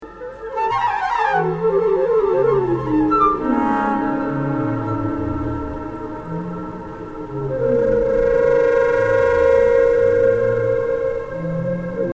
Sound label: reverb harmoniser and ext pitch